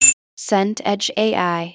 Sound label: beep high